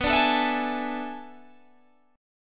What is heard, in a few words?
B Minor 13th B down Guitar Open G